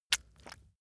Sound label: leather impact mud medium